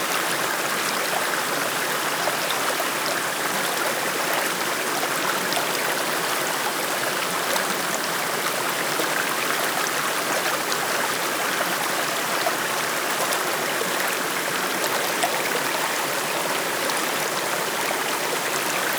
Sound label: Waterfall Loop